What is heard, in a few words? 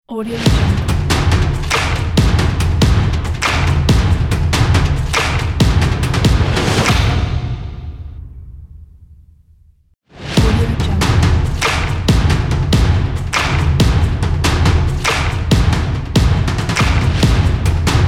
aggressive sport drums logo